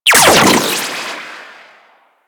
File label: Shock Rifle Primary Shot, weapons; Warframe; Corpus